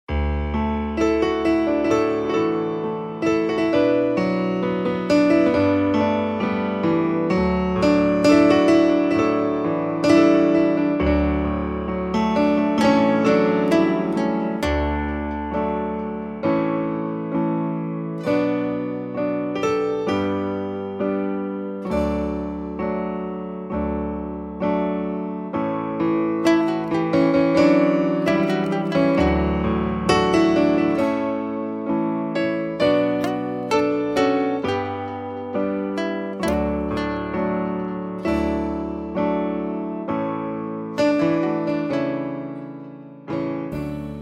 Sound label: D No Backing Vocals